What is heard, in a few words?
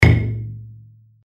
hit; Triple Dragons